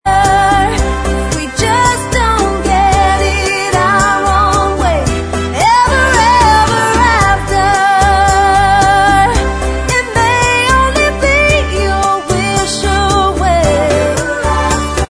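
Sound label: bossa version instrumental, backing tracks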